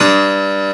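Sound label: PIANO ROOM G1; AKAI S6000 CD-ROM - Volume 1; PIANOS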